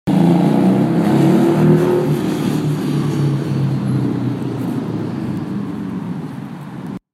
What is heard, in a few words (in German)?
motorrad